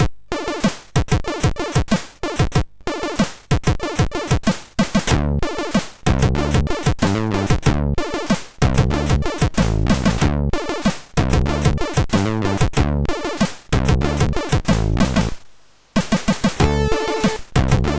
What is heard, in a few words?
MOS6581R2, sid; Gylletanken in soundmonitor